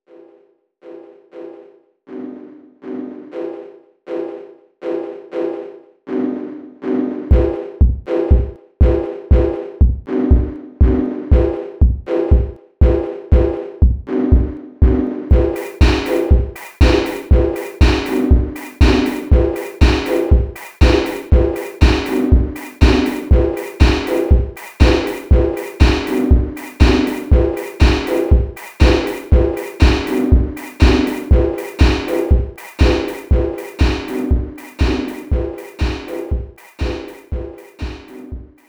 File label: Sinewave